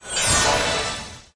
random symbol transform; fairyred

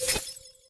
quick Stop; joker pro

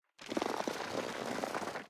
reel spin start; goldentavern

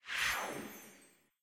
idle Out; joker pro

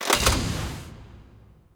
spin Start; eggomatic

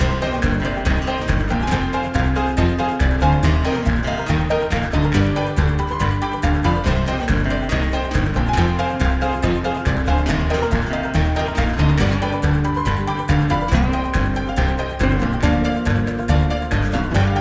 free Spin saloon max; dead or alive 2

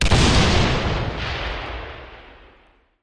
space Ship Exit; spacewars

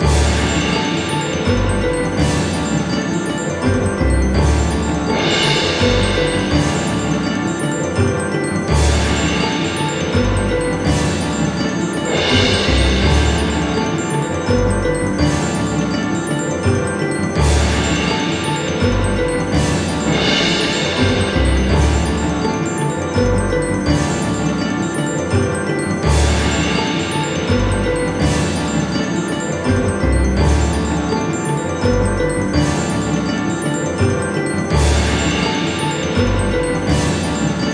big Win Loop; secret of the stones

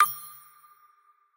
fs Count Down; parthenon

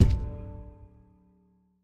reel Stop; fairyred

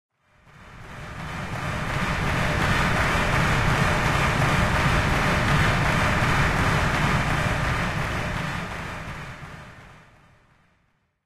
crowd Chant General, football

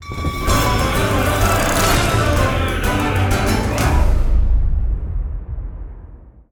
free spin intro; golden tavern

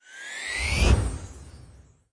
count up; jacks or better multiple hand

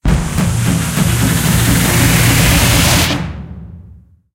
nearwin; rome

